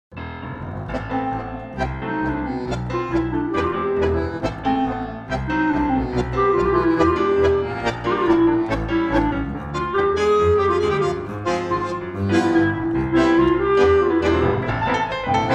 play clarinet reference

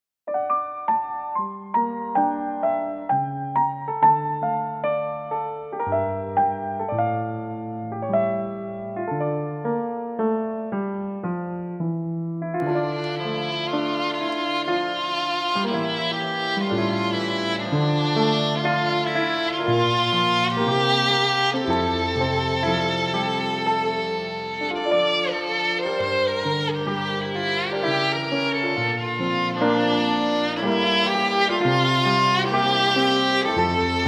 piano play referencia

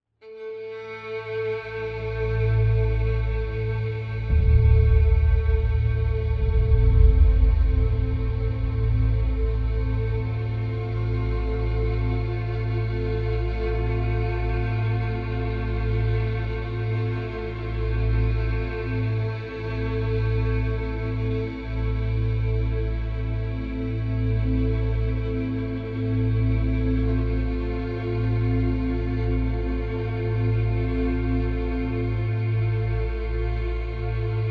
Duduk

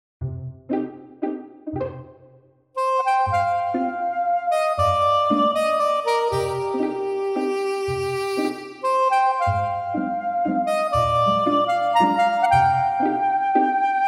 saxo

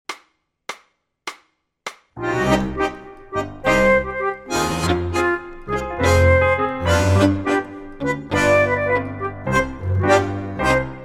flute, C

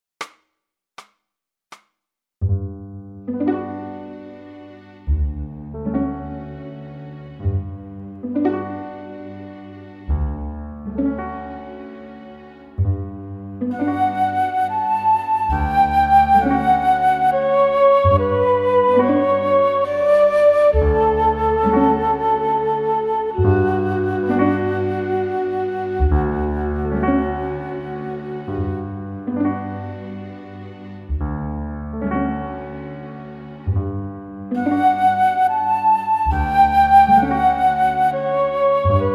flute

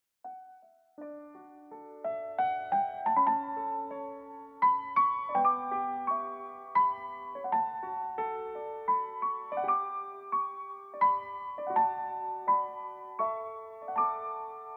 play referencia flute

